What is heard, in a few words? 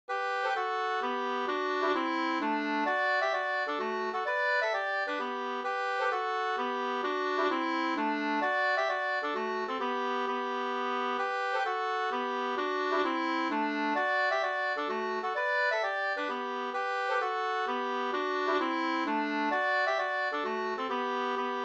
mazurka; e Batsman Dristig Bh 2st